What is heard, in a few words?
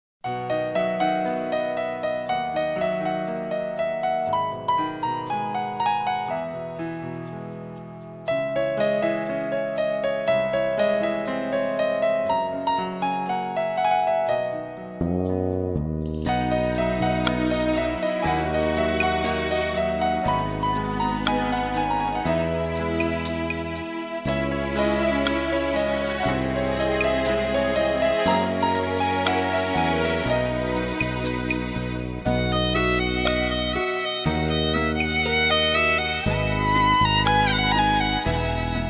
Instrumental